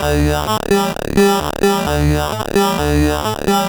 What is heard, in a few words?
MiniBrute Loop, 130BPM; musicradar; bitcrushed; Crushed Loops